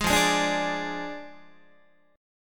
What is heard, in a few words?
F Minor 6th F down Guitar; Fm6; strummed; JGuitar